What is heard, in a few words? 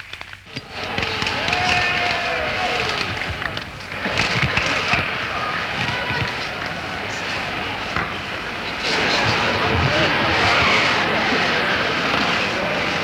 Wind Tone Sound FX and Soundcheck